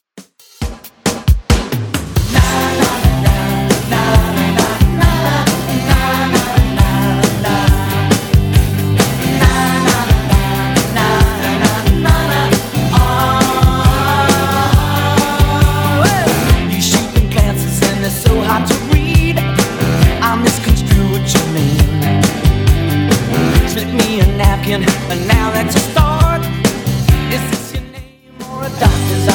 90s Rock Redrum 136bpm

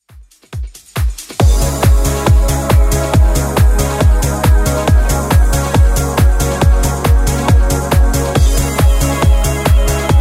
80s, 138bpm

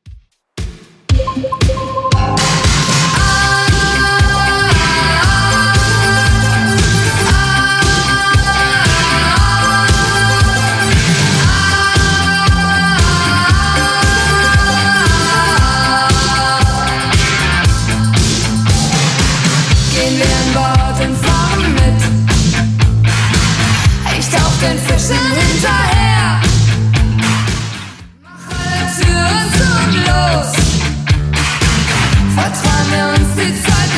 80s Redrum 116bpm

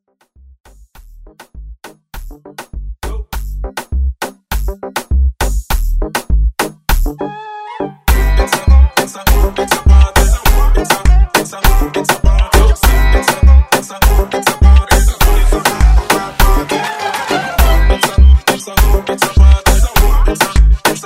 Moombah Vocal

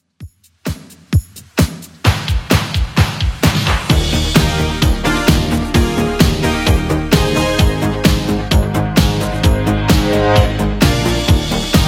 80s Redrum 130bpm

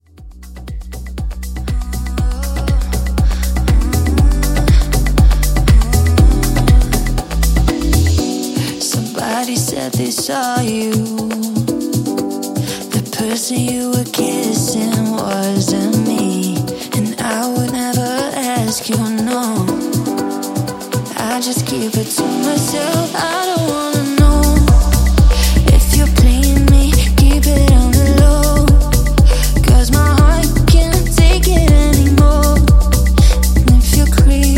Afro House; Remixfreakz

